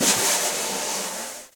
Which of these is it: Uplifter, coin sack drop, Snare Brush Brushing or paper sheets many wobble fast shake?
Snare Brush Brushing